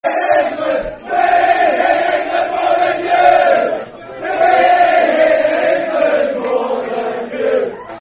We Hate England More Than You, Football Chant, N. Ireland; N. Ireland N.i.f.a